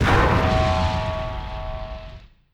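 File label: Rivet Hit Interior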